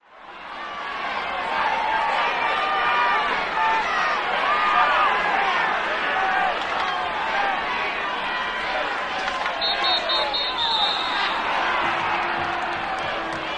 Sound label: Crowd chatters and cheers with referee whistles during a high school football game.; Cheer, Crowd Crowds, Cheer Sports, Football